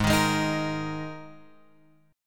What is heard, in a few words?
G sharp Suspended 2nd, G sharp; G#sus2 Chord {4 6 6 X 4 6} strummed